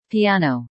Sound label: piano